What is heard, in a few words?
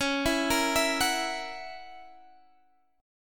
Gb 7th Db, chord